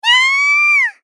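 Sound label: Gentle, Damage, "Ahhhh", Japanese female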